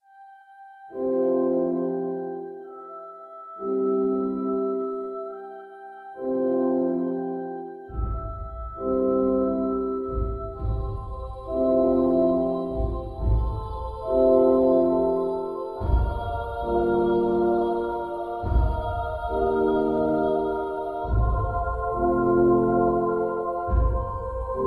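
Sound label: Dawn Music Shimmery; creativerse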